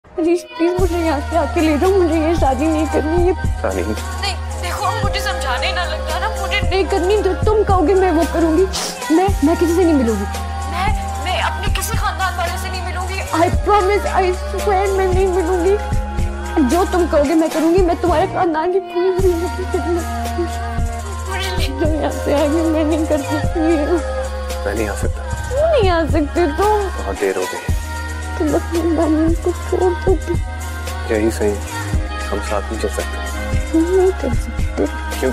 Heart Touching Ringtone

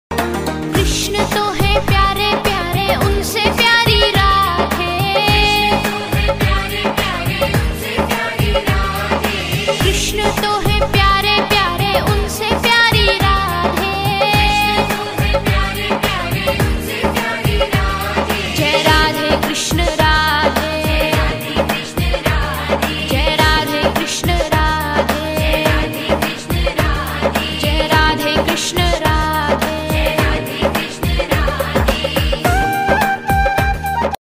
Bhajan Ringtone

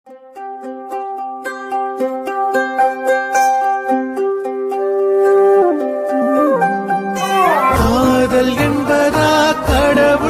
serial Ringtone; Siva manasula sakthi

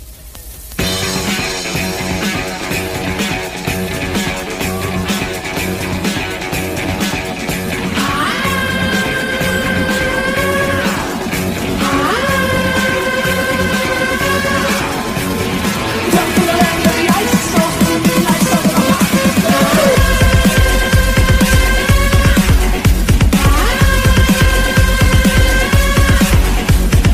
Bgm Ringtone; Thor Ragnarok

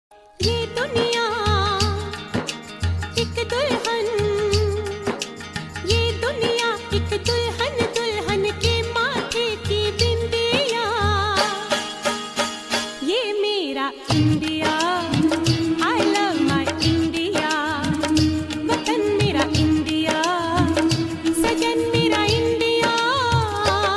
Desh Bhakti Song Ringtone

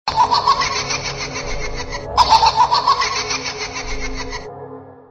Scary Clown Ringtone; ringtones

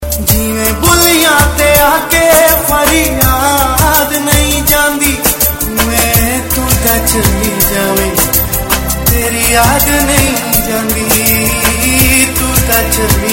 Punjabi Sad Ringtones For Mobile Phones

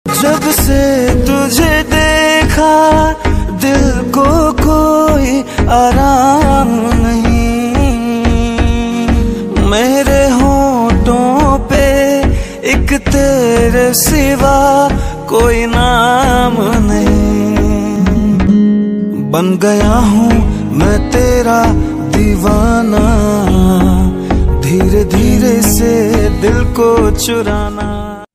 Romantic Hindi Song Ringtone

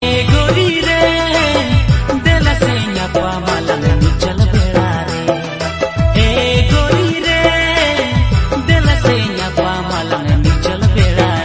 New Santali Ringtone

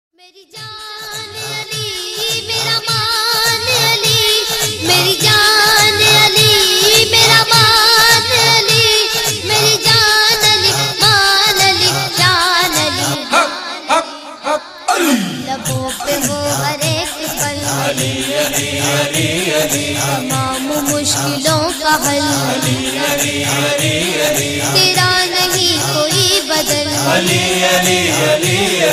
Naat Ringtone

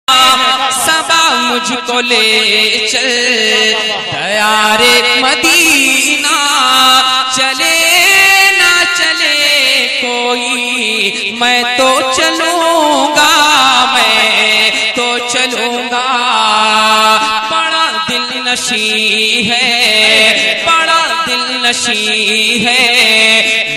Naat Ringtone

